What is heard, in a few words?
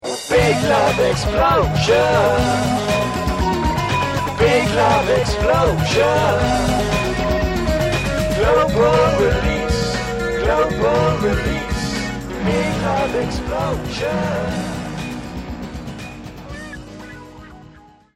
big love explosion; silly automatic